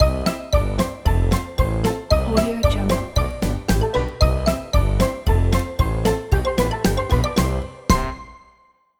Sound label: funny way logo opener